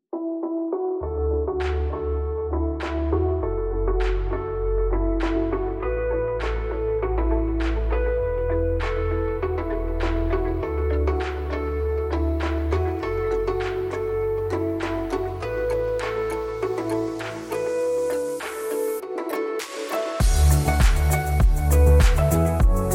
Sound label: chill house lounge; Barcelo